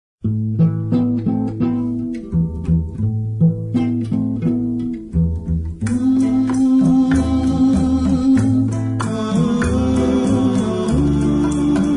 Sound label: Marimba